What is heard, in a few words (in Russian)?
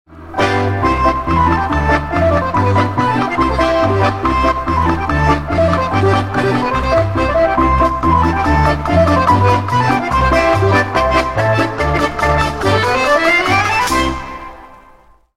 Финальная мелодия